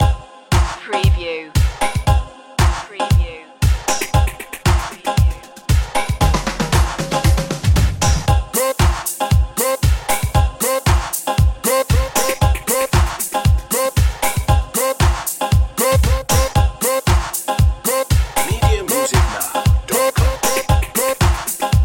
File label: electro ghost 116bpm